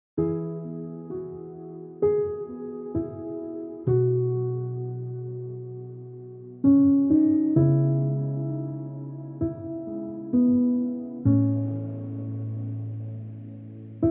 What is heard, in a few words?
Instrumental Piano Ghamgin